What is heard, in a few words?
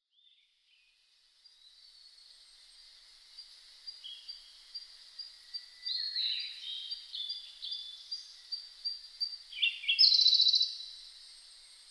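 Siberian blue robin; koruri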